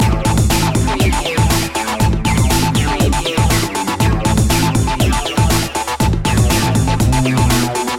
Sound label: unnatural science loop